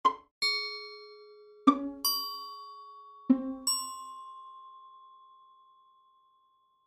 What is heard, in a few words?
Pizzicato String, Pizzicato String Glockenspiel Water Drops; Cartoon; Musical; Blastwave